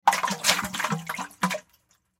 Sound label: Container Shake, Container Shake Slosh Bucket; Shaking; Blastwave